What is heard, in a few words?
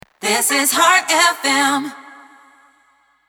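Sung Jingles Db major 130bpm Acapella; 79.5 Heart FM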